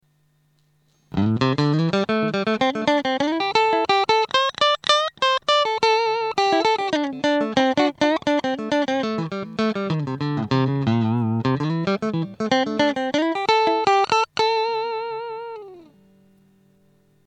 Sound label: Middle Squier, guitar pickups